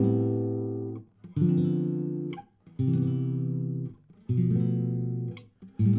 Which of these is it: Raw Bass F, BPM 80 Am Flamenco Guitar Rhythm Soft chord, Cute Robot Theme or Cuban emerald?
BPM 80 Am Flamenco Guitar Rhythm Soft chord